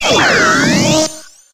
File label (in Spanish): Grito de Rotom